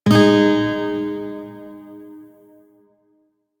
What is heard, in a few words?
Shawzin Chromatic Chord F; Music; DE; Warframe